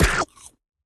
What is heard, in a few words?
duck; r e p o